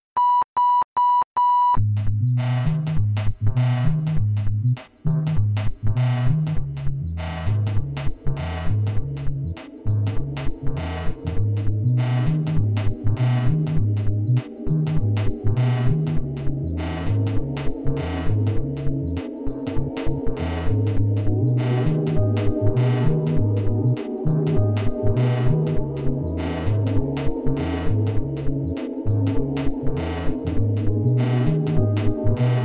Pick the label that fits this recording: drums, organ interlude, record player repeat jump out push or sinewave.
sinewave